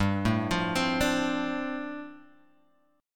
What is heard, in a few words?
G 7th Suspended 2nd Suspended 4th, Guitar Open F